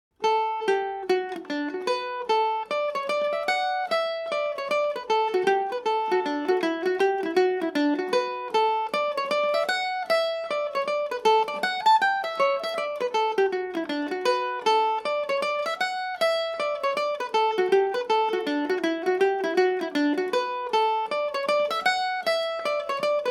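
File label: barndance, D major, mandolin; The Three Spruce Barndance; G&O #34 mandolin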